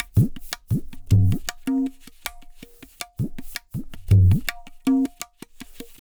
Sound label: Pure Tabla, 080BPM; Zero-G